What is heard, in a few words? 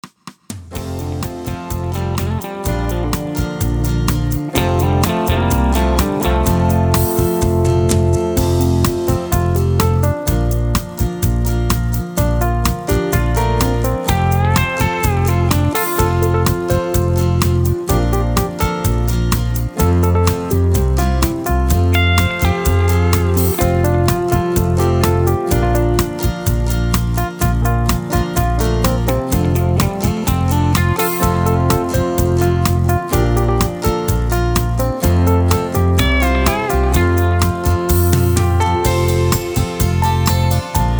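Melody NO background vocals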